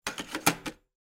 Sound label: cassette in